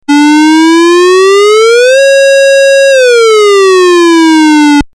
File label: hooter